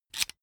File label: electric drill template adjust heavy in; soundpool